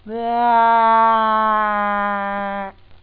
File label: yell